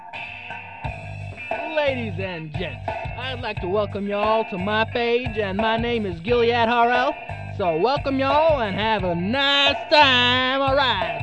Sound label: lalala